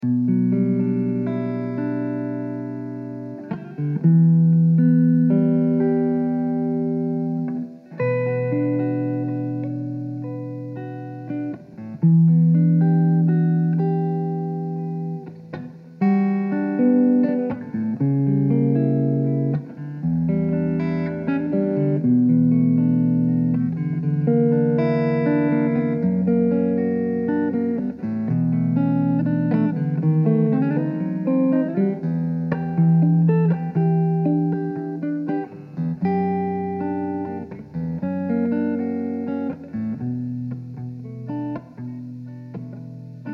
Bm 120 Jazzy Elec GTR chords

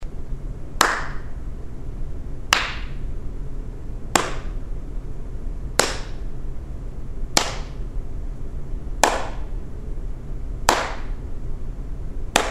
clap

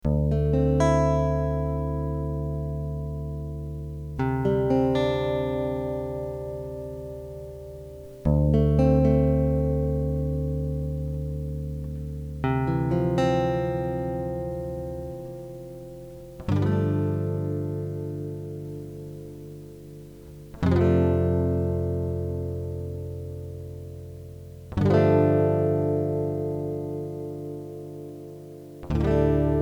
Dm 116 GTR dry

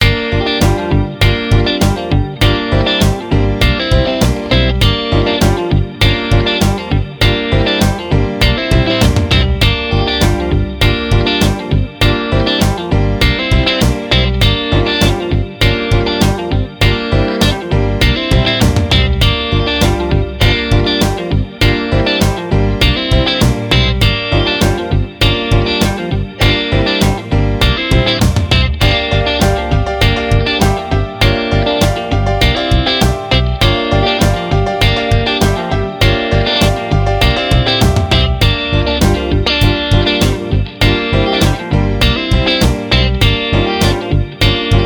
Gm Rock Groove; admiralbob77

